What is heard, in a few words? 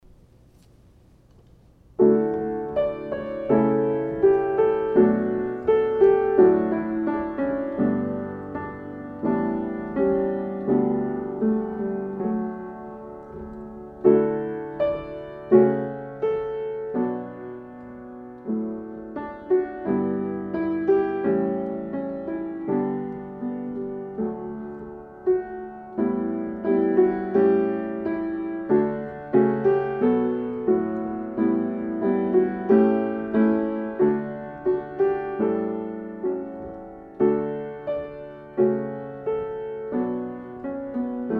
Salzburg Melody; Still Still Still